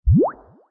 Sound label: boat click